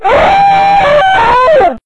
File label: scream; raylib; skully escape